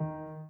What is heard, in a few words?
Piano Chord